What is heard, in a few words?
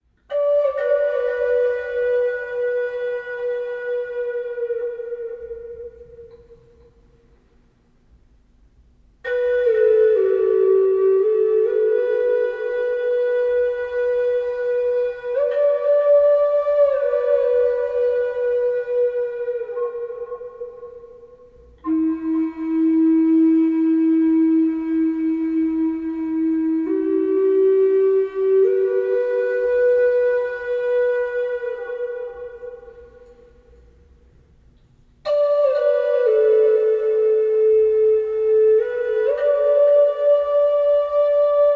Zen Yoga Flutes